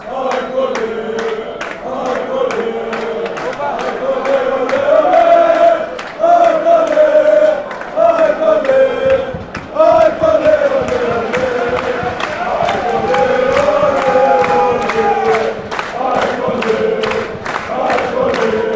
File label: aek athens chant, fanchants